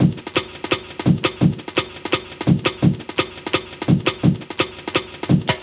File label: Break, Drum & Bass, Beats & Breaks